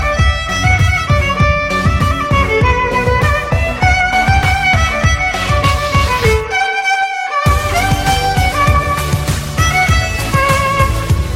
Violin

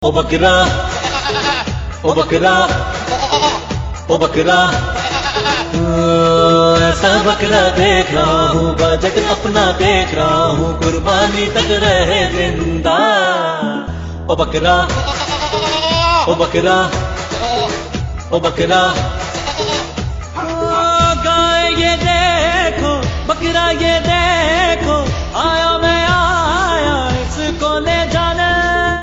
Goat Song

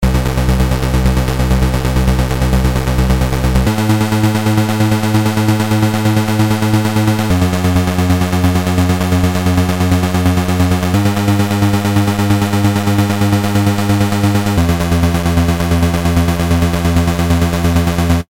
Arpeggio

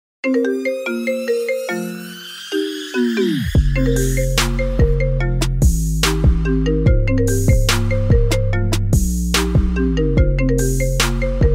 Trap

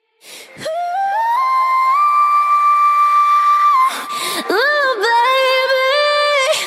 Everyday Vocal

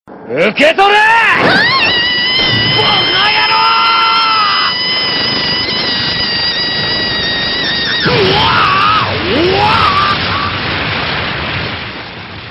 Cry; Rukia; Bleach

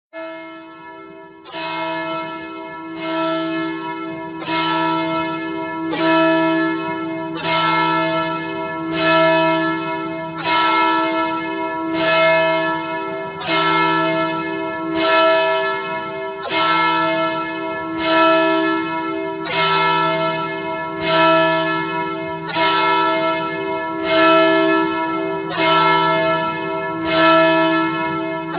Bell Church

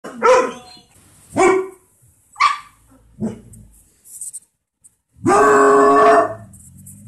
My Dogs

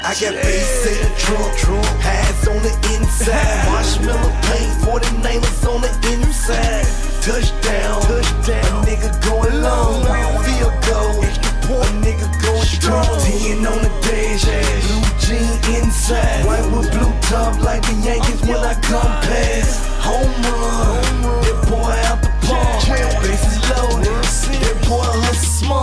Touchdown

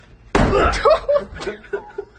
Head bangin

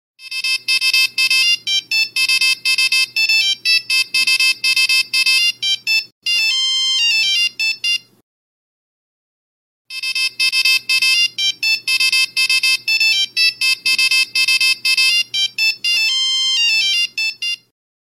Retro Nokia; Nokia2013

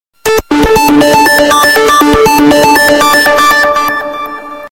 High Sound Ring

